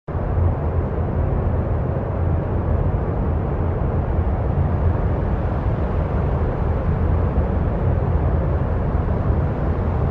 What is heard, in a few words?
Amb Bunker Int